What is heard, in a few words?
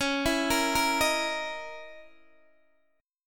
Db Minor 6th Add 9th Db down Guitar Open D